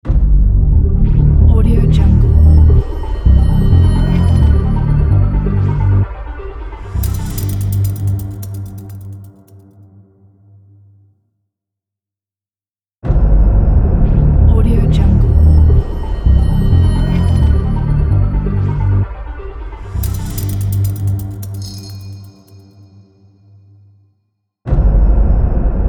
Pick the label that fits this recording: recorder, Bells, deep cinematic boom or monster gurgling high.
deep cinematic boom